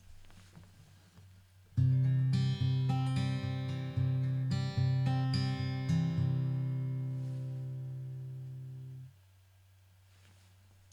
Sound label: C picked, guitar